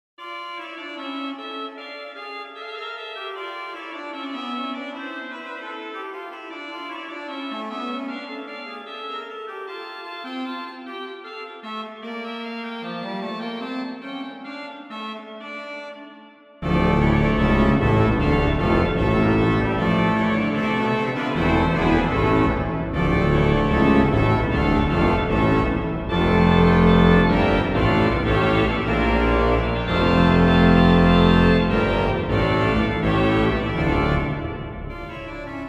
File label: Messe Noel, OrganMusic; Fessy & Mine; Souvigny